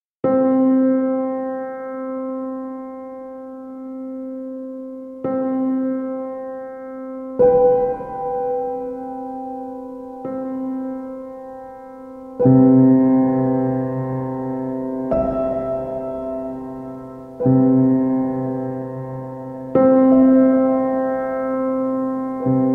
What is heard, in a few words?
Piano Sounds